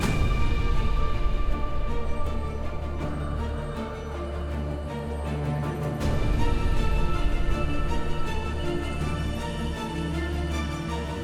Battle Snow

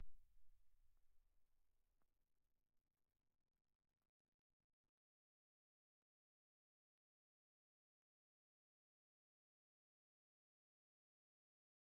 Real perc Phrase; Worms Rumble; PS4; Xbox Series X/S

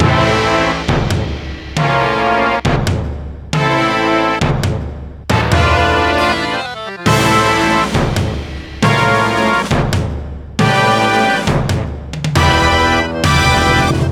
Boss Phase Intro; Sparklite

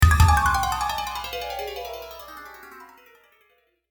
bgm spider fail

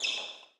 Sneaker Squeak Regular Court

